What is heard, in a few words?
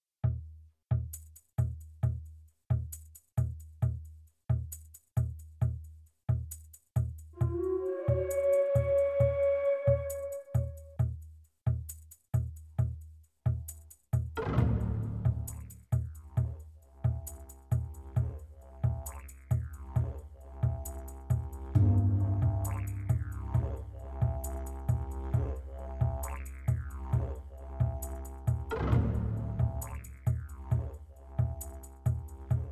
cavern, ambience music; PhoenixCraft 1.16.5